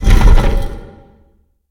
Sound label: tomb door open; Fate The Cursed King